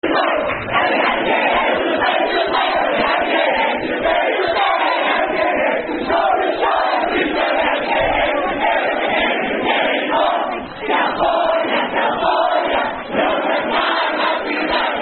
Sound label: Drinking Song; Camp Blue Week 3 2009